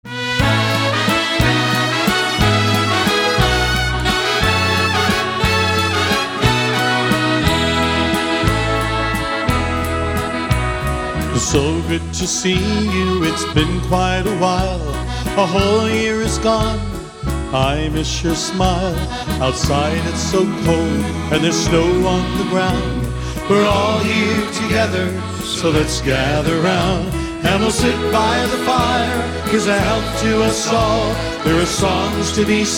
Christmas Waltz

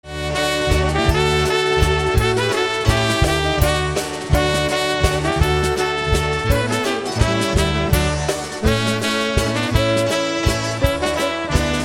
Waltz

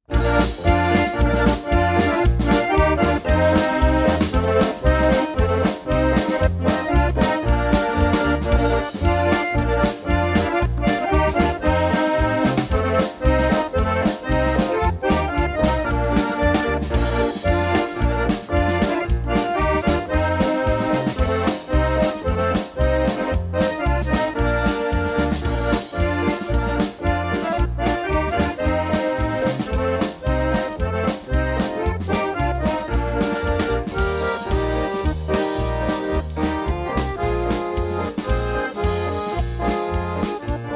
Polka

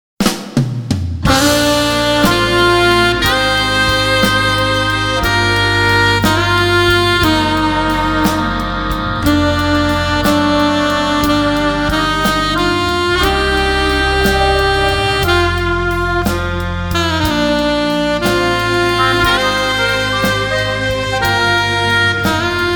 Medley

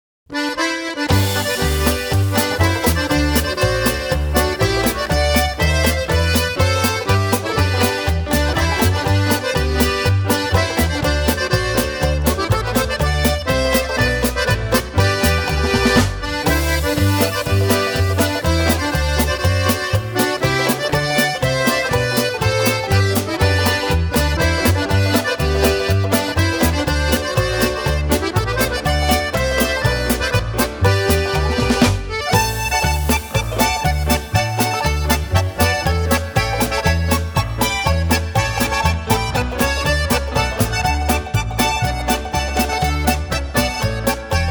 Polka

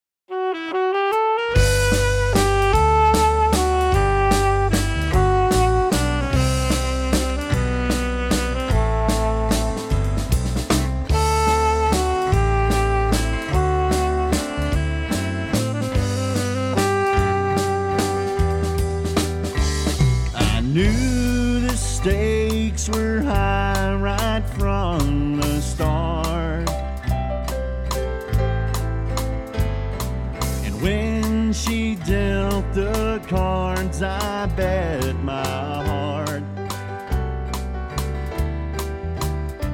Waltz

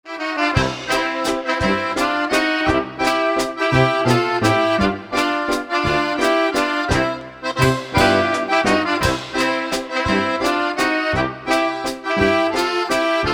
Waltz

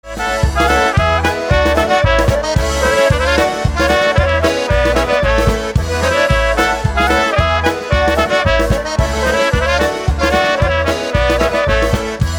Jukebox Cheer Polka

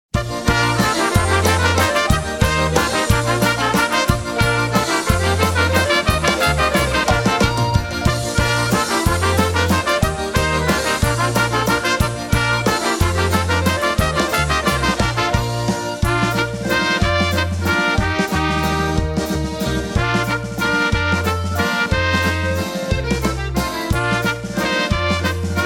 Oberek

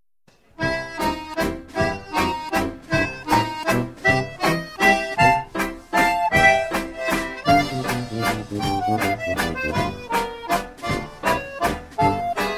Waltz

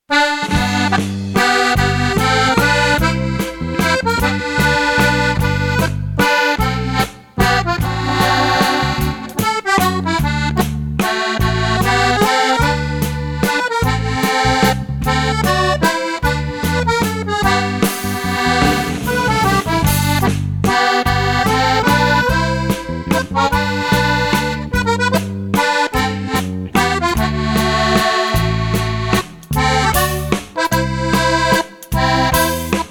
Waltz

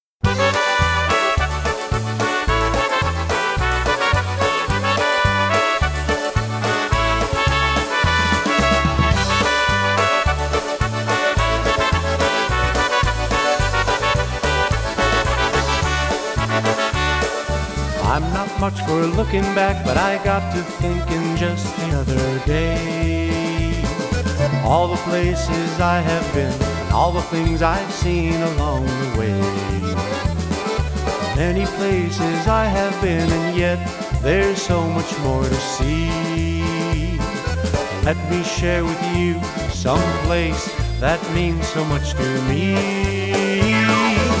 Polka

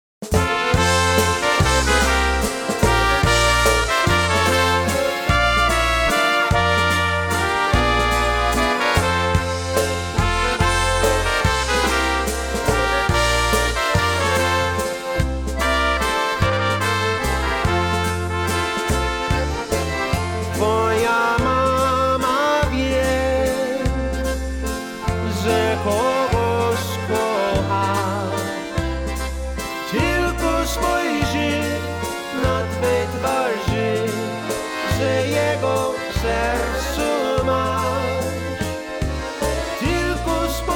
Waltz

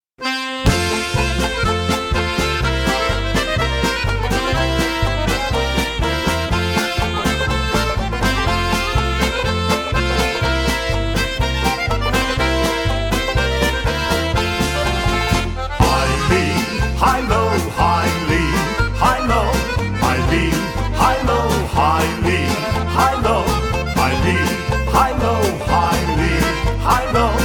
Polka

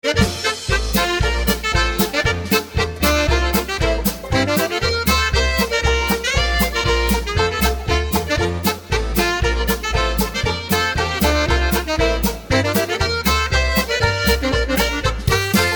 Polka

